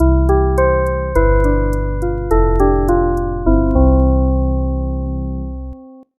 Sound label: bed full